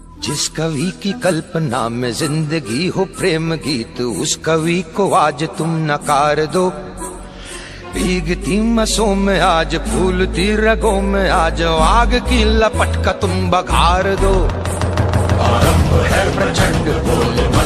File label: Motivation Ringtone